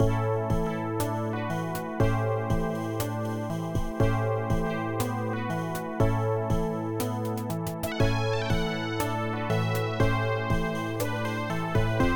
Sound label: bluesy